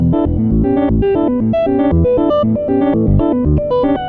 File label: 8th Repl Loop